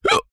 human male hiccup single

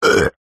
human burp male 38 years old

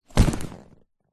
foley luggage bag leather heavy drop; zapsplat

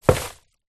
shopping bag plastic full put down wooden floor; foley

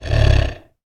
horror monster grunt or growl

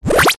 cartoon slip ascend fast; zapsplat

